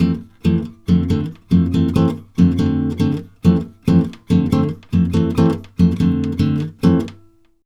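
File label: 140 guitar F7, Brazil Bossa; USB Soundscan; AKAI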